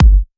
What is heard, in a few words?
Bassdrum; Vengeance Samples